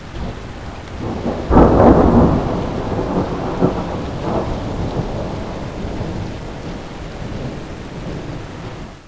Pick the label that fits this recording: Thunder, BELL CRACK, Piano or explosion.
Thunder